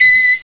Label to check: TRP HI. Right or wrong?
Right